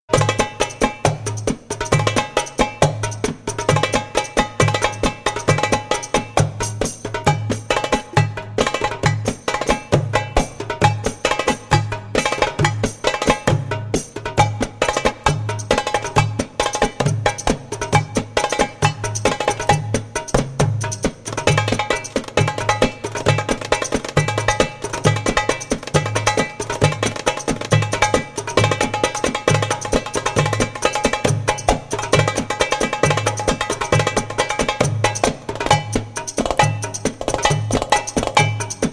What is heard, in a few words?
tabla solo; bazar